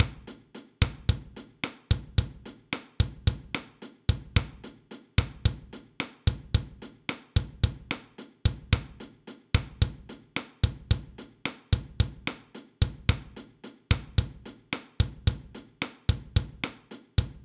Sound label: Bossa Nova